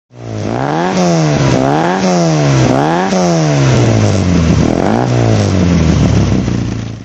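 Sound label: austin healey sprite mk2 throttle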